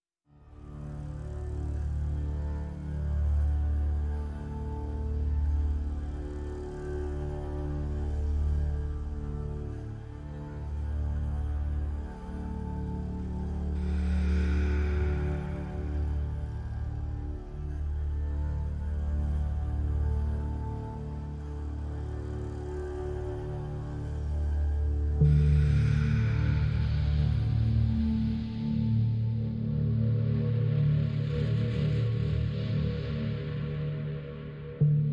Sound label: Pads Only